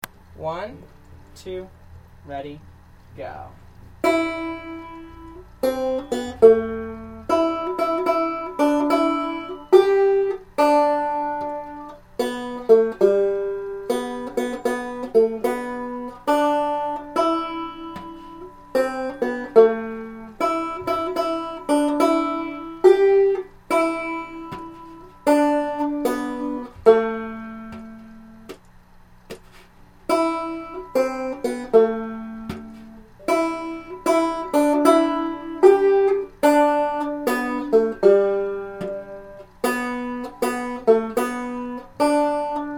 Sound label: banjo